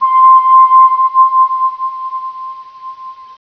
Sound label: Bowed Glass C6; Star Trek JR Audio